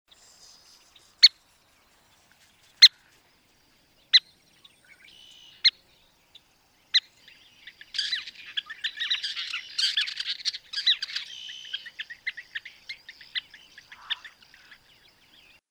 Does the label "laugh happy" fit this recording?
No